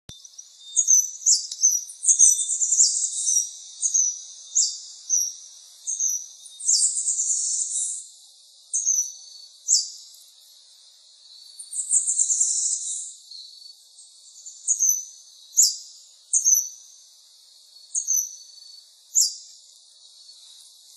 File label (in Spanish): Ermitano escamado; Misiones; Camino del santuario